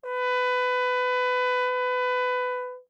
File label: Tenor Trombone ff B4; Brass